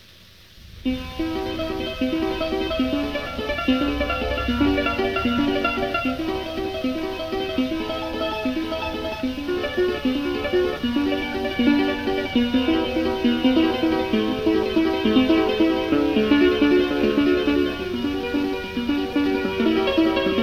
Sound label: Clavichord; A Dolmetsch